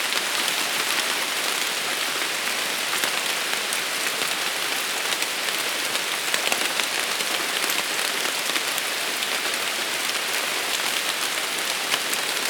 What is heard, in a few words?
Rain Through Leaves, Whalley Lake; Loopable; Cottage Sounds; whispering landscapes